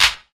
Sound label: clap single shot f sharp key; single hits one shots